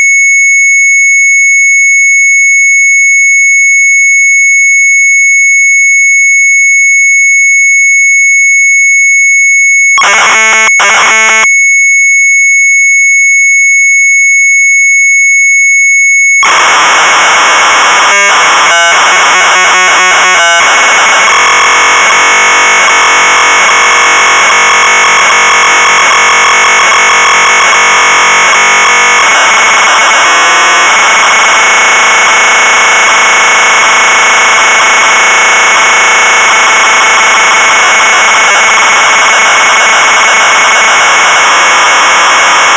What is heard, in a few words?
MZ800 Tape, sharp; BOWLEN; retromelsarchive